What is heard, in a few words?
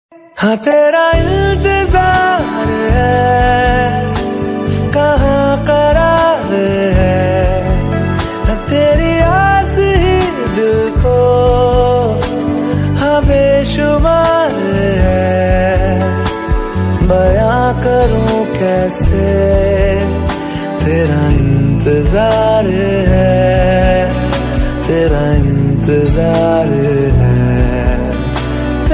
Love Song Ringtone